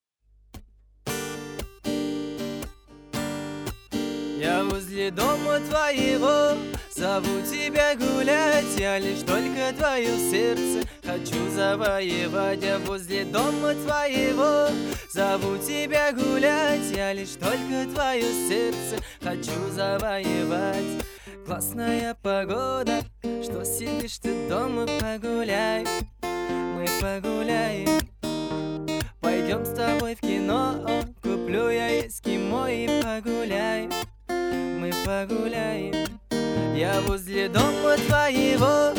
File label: acoustic version